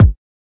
kik

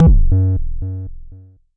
Jomox MBase, drum machine; Jomox MBase 01

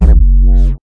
double kick; Kicks; single hits

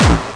obnoxious kick

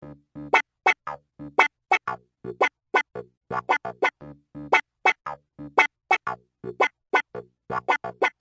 clav; Instruments

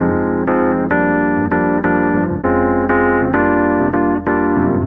099 deep soul rhodes; KEYS ORGAN PIANO LOOPS

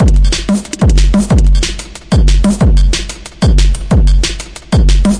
verbyroller, DRUM LOOPS and BREAKS, 184 bpm

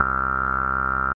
filtery synth bass; SYNTH KEYS

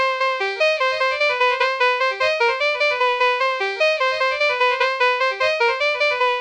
flat synth jig; KEYS ORGAN PIANO

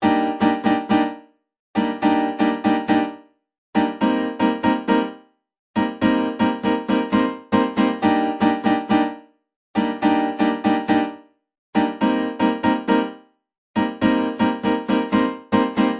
120 almost latin piano 7ths; KEYS ORGAN PIANO LOOPS

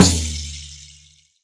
Processed Ethn Perc, ETHNIC and WORLD PERCUSSION

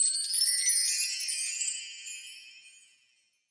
chimes climbs; Western and Latin Percussion; single hits

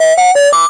speak and spell STARTUP MELODY

